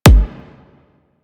Briefcase Akimbo Pistol Punch; Warframe; Weapons; Corpus

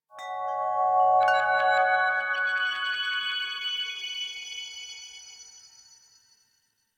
Chimes; Warframe; The New War; Narmer Outpost

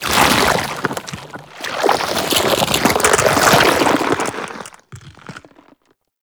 Knight Spawn Riser; Enemy; Mendicant; Mendicant Wazzard Knight

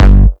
EDM Pack Slot, BardInstrumentPacks; Warframe

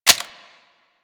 Clip In, Weapons; Warframe; Afuris

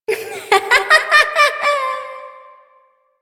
Laughter

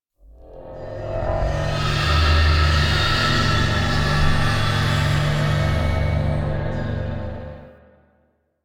Exterior Jealous Sky Screech; Warframe; Ambience; Duviri

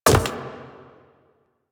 Hand Bomber Proj By Bounce; Warframe; Man In The Wall

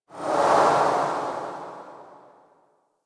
Museum Item Look Whoosh; Warframe; Vitruvian Museum

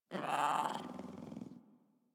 Kitten Speak Playful; Warframe; Catbrow

